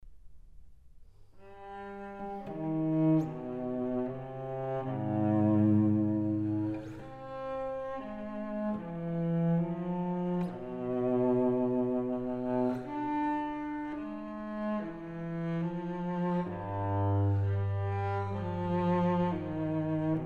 Suite for solo cello No. 5 in C m; Bach; Cello Suites

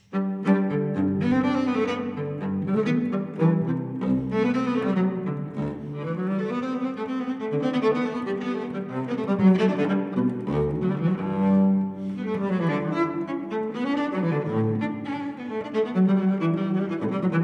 Cello Suite No. 1 in G major Courante; BWV1007; Johann Sebastian Bach

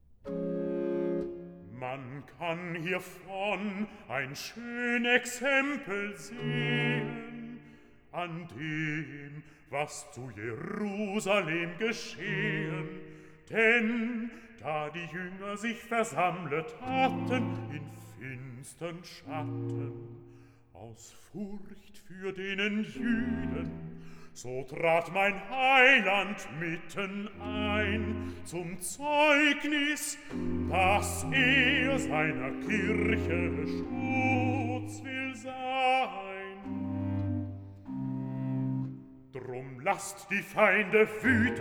Recitative; Cantata BWV42 Am Abend aber desselbigen Sabbats; Mann kann hiervon ein schon Exempe; Bach; J S Bach; Koopman Complete Cantatas Volume 14

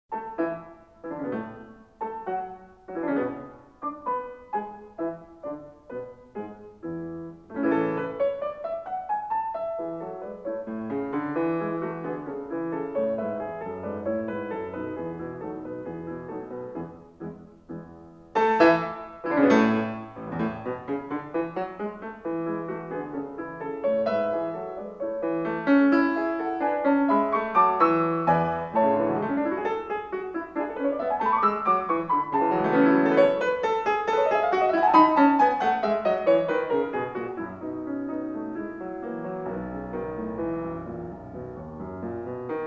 Piano Sonata No. 2 in A major; Wilhelm Kempff; Beethoven; Piano Sonatas

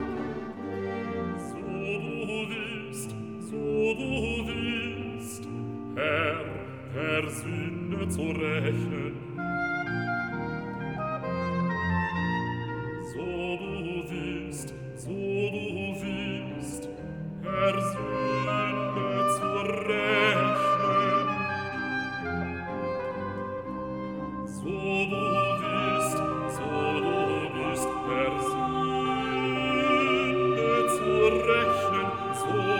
Arioso Basso mit Ch; Cantata No. 131, 'Aus der Tiefen rufe ich, Herr, zu dir' BWV131; Bach; Philippe Herreweghe; Cantatas

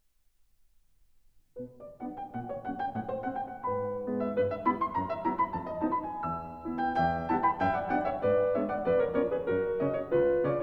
Capriccio for Piano in B Minor

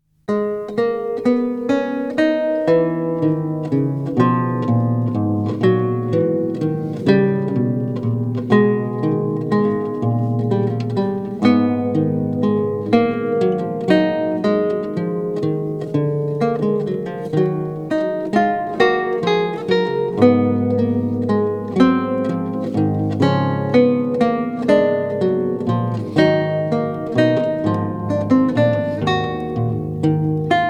Lute Partita in C Minor, Fugue; Bach, J S; BWV 997; II. Fugue

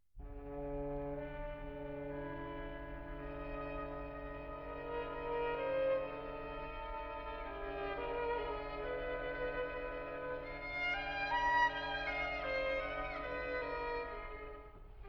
Quartet C Sharp Minor, String Quartets; Béla Bartók

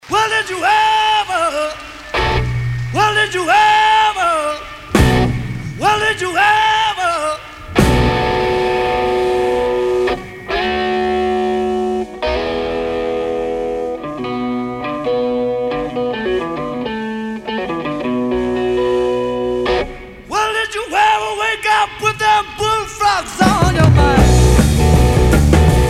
Blues

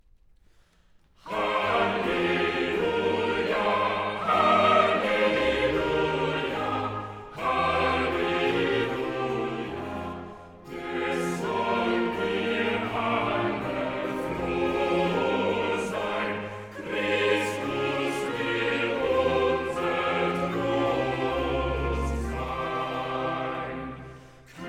Chorale; Hallel; Bach; J S Bach; Easter Cantatas; Erfreut euch, ihr Herzen Cantata, BWV 66

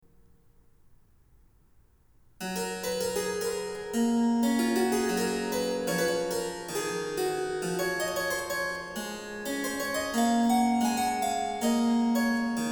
Harpsichord

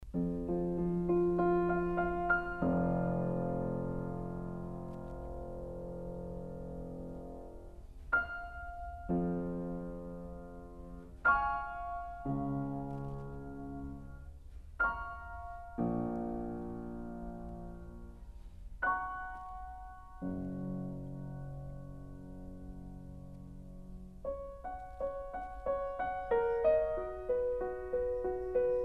Piano Sonata in B flat

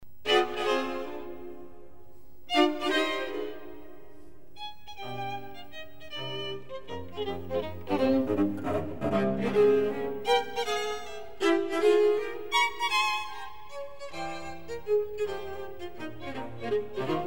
String Quartet in F minor

